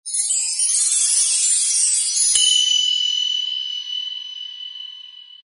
pixie dust

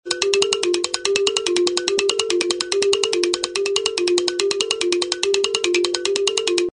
wood blocks newsroom beat

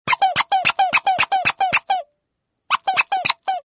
comedy jumping squeezes

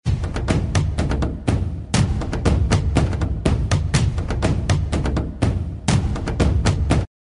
tribal drum rhythm

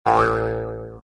comedy sproing boing

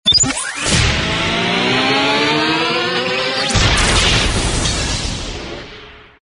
comedy failure to launch

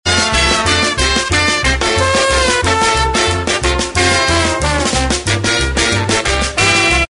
comedy horns a plenty

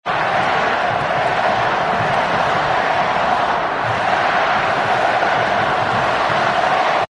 sports soccer crowd yelling

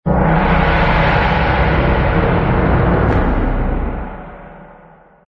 perc gong roll with timpani

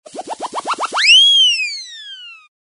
whistle rapid zing

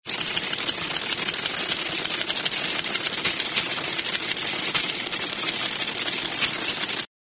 comm teletype low run

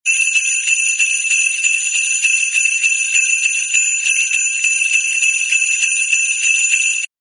bell sleigh large continuous ring

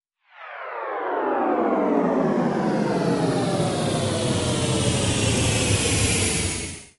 Time Warp Alert

